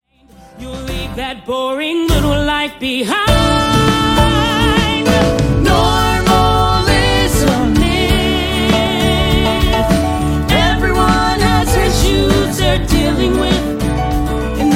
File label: Vocal; Percy Jackson and the Lightning Thief